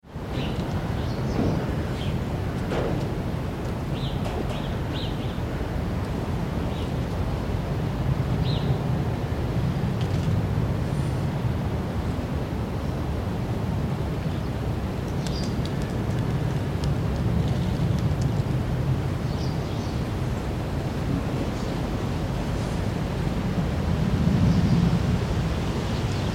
Wind Through Open Barn, Strong wind with barn movements and squeaks; Tom Hutchings